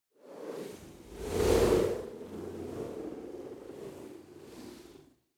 Wind Gust Fast Cold - Short And Thin Blizzard Whoosh; Hollywood Edge; sound designer's toolkit v2